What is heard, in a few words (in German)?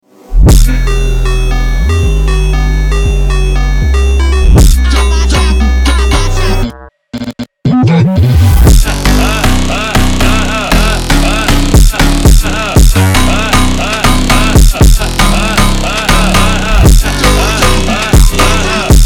Kontrabass solo, Preludio; Preludio e Fuga f r Kontrabass solo I.